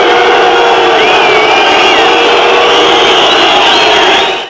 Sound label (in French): SIFFLETS; Profanglais